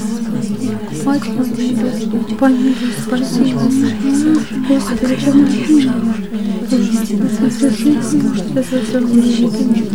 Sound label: NOISE, CLASSIC VOX; Best Service; Hallelujah